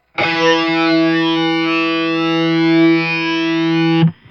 フェイザー

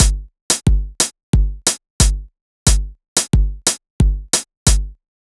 クラーベ

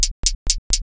ハット

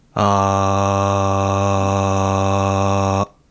人の声

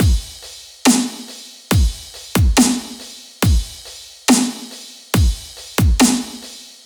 シンバル

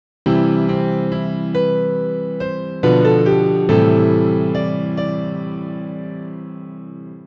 メロディー

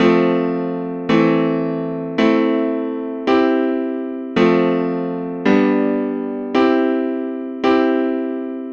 Ⅳ→Ⅲ7→Ⅵm→Ⅰ→Ⅳ→Ⅴ→Ⅰ進行

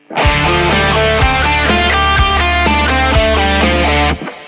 ペンタトニックマイナースケール

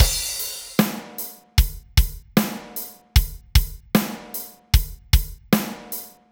リズムパターン

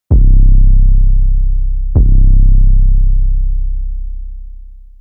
808 サブベース

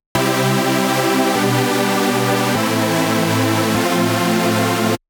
シンセ

